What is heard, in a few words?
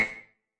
machine, electro hits; Hip Hop; style kits